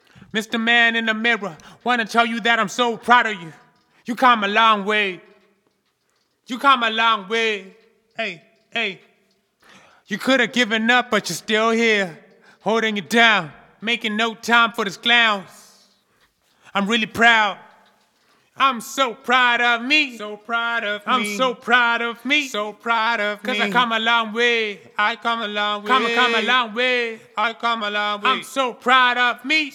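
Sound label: HH Rap Sing 100 C sharp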